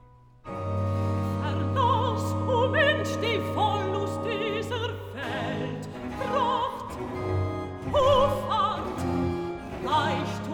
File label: Cantata, Recitativo (Alt); BWV 20 'O Ewigkeit, du Donnerwort'; 'Verlass, o Mensch; Bach; London; 1st Sun After Trinity